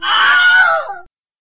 krik babuski kogda pugaetsa

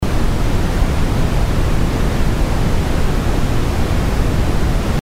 siln shum ushah

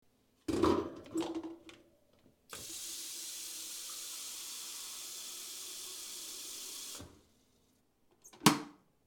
elchainik kryshka voda

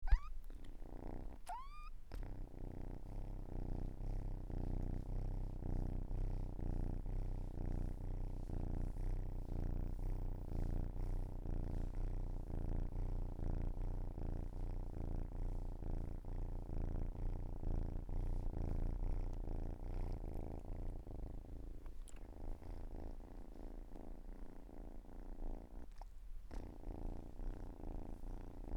mur kotenka

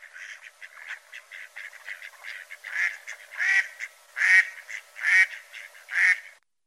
kriakva

